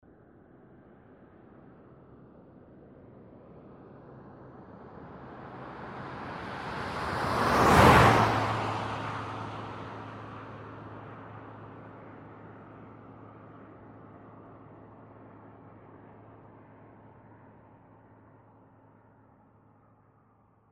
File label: Cutlass Pass, Car; zps; Ambient; Vehicles